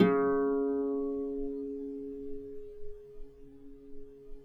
Db2 HARP; USB Soundscan vol.62; AKAI

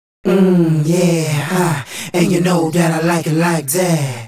Male Group, Vocal

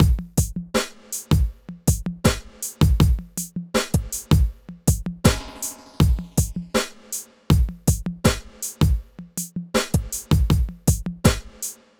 Beat, Hip-Hop

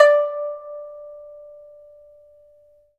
PIPA D6; Spectrasonics; Heart of Asia

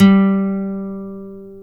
NYLON G 3, Acoustic Guitars; AKAI S6000 CD-ROM - Ultimate

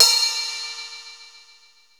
BELL CYM RX5; Greytsounds VOL-1

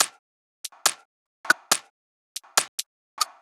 drum Loop 140BPM Perc; Vengeance - Essential Clubsounds Vol.5; VEC5 Drumloops; VEC5 Multi Loops 140BPM

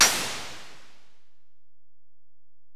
EPerc, Alesis DM-PRO; 300 Drum Machines

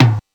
DP50 Tom, Technics PCM DP50

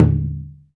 PER, Percussion

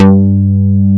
bass loop; Best Service Gigapack 2

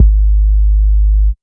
BOOM, BASSDRUM, Techno 138 BPM

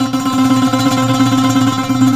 SAZ TREM C 3; Soundscan vol.53; Mediteranean Traditions; AKAI

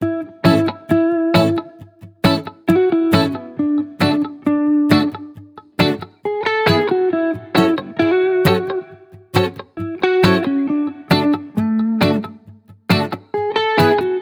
Guitar, 135 bpm, key A, Back to the 70s Guitars; Fox Samples; Full Mixes

